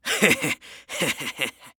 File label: Human Male Laugh Creep Laugh Short